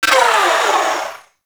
Uzi Tail Wind Down; Warframe; Tenno; Prime Tenno Uzi; Weapons

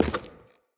Grunt Step Wood, footsteps; Enemy; Corrupted Sproutfolk

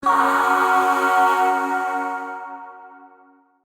Shawzin Yo Chord I; Warframe; Zariman; Void Shawzin; Chords

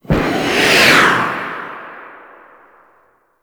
Boost Thruster Warp; Warframe; Archwing

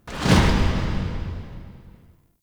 Interior Orbital Station March; Warframe; Tau

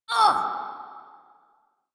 Pain, Barks; Warframe; Corpus Lich; Persona D

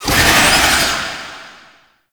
Summon Vocal Verb; Warframe; Uriel; Gulphagor

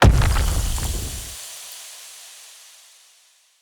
Fey Mist Impact; Powers

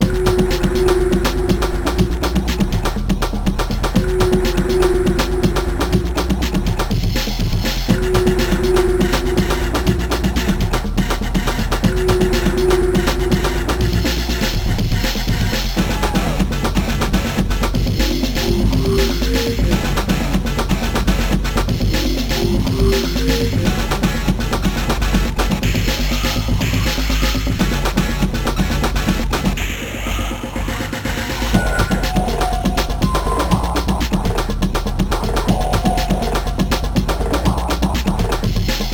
Music Level Boss; Warframe; Caliber Chicks Minigame

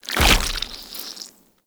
Land Heavy Goo, Footsteps; Warframe; Tenno

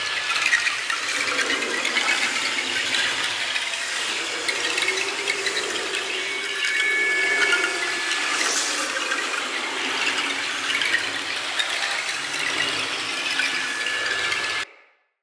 Hallucination Smog Loop; Bromius; Abilities

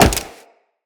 Wall Jump Metal; Footsteps; Wall Run; Characters; Tenno

